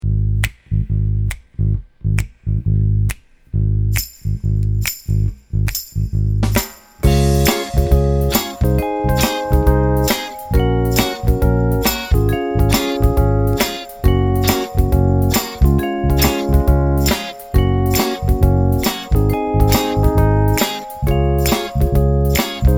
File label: Motown Groove; production music; RB